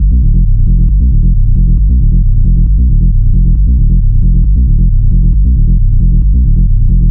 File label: Bass Loop G 135, Techno; Ghosthack; AC2024 - Day 1 - Techno Sounds 2025